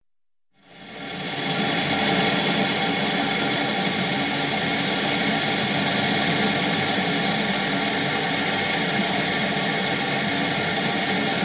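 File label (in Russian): звук электрического чайника закипание воды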